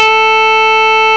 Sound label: elec org; M8; CMI Factory